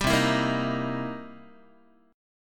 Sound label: C 7th Sharp 9th, C, down, Guitar; strummed; 10,8,7,8,7,x; 4A,4E,5A,5D,5G#,6C#; chord; JGuitar